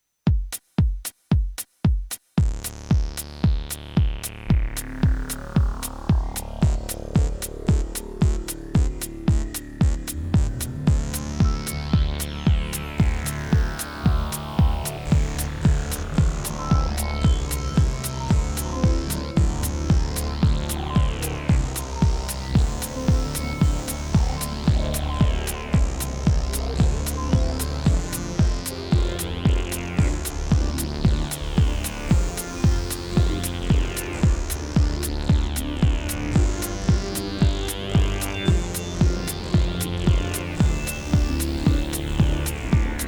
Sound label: Instrumental